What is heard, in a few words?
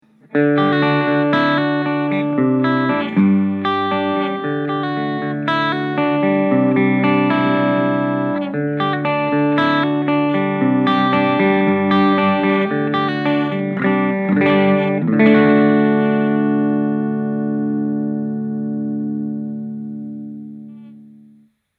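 Blackstar Super Fly Clean Humbucker, guitar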